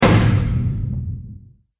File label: Explosion Impact Solid, bomb; Bomb Sound Effects